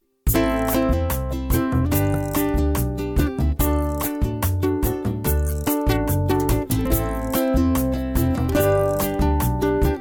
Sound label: ukulele cocktail hour